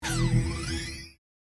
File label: chime